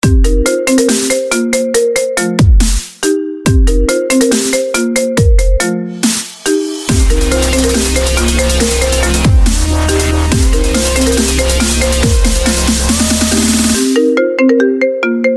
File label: iphone remix; ios7